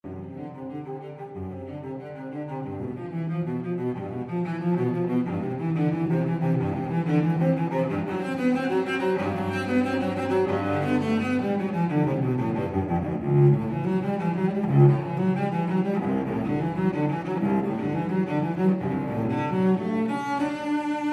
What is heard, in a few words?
cello; bach